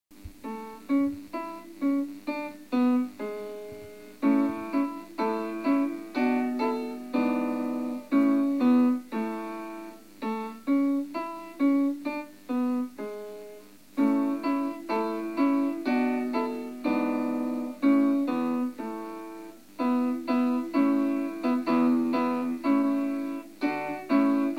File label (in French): Gavotte